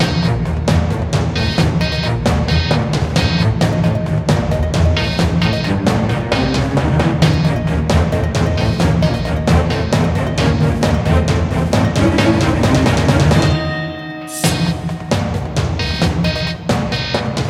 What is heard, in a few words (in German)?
Beat Neid Master Mit Bea